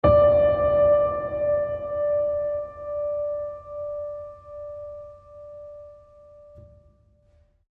Tranquil Mid Piano Ping

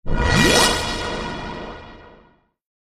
Pipe Echo Up

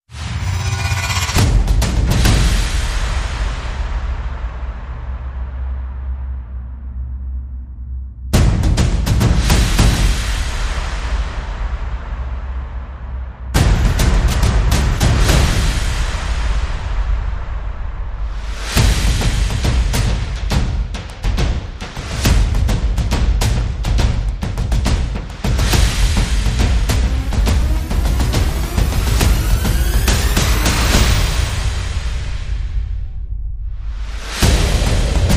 Percussion and FX

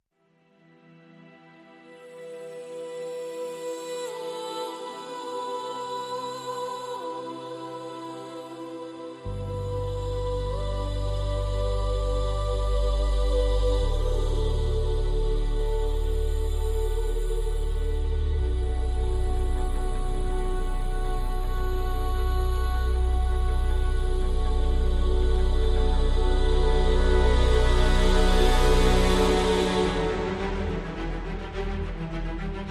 Light Perc

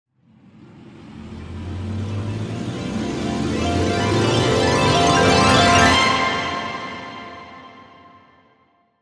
Magical Rise

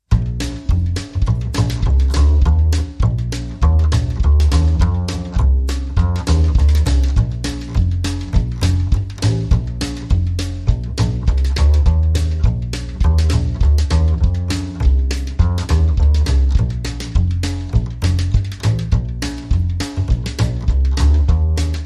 Down Home Bass, Acoustic

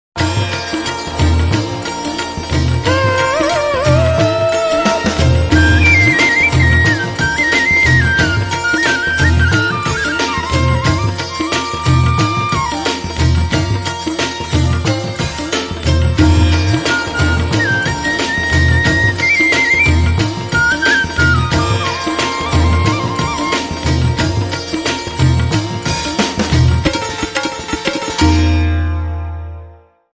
Hindi Beats

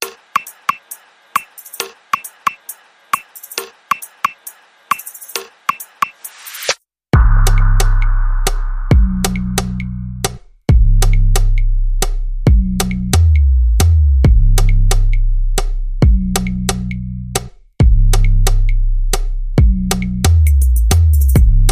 Elevator Musica

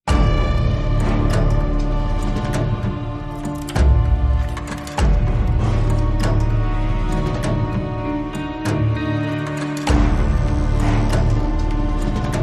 Forgotten Fear, No Choir and Whispers

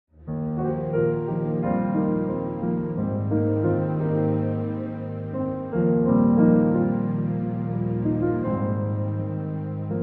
With Strings

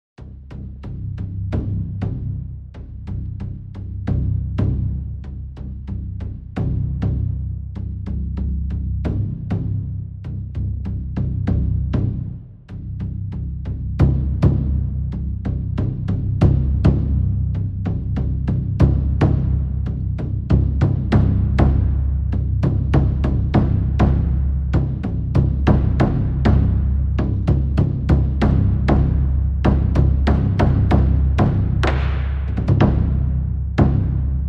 Simple Taiko; Hattori Hanzo

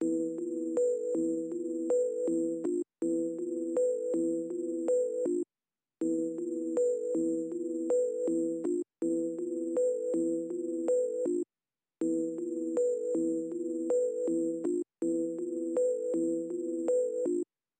Dihoto, Bells

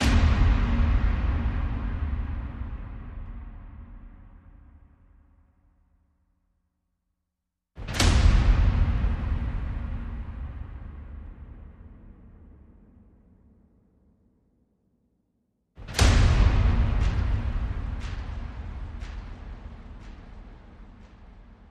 Percussion and Booms

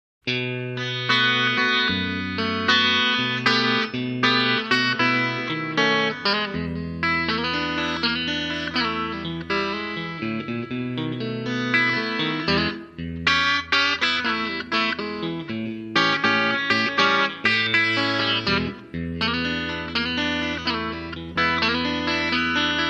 Electric Guitar Slow Blues

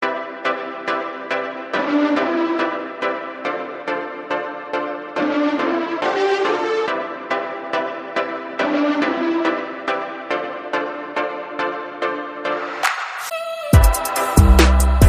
No Siren Effects

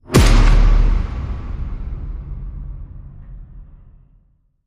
Hit Big Smack

